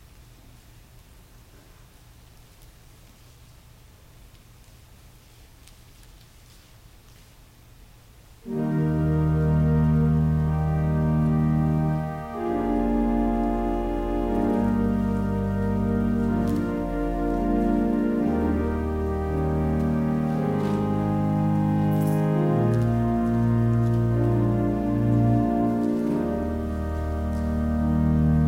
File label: Organ Music; The Lord's Crowning Goodness; psalm 65 11